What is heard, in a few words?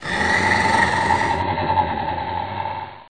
gonome idle; mystic radar; mr zombie1